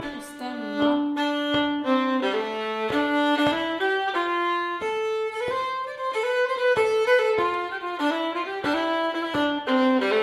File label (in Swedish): Slängpolska 2a Stämma; efter Karl Kollberg